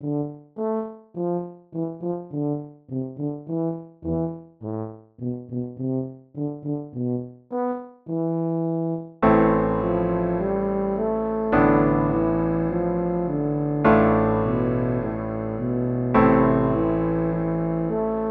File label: Aardonyx tuba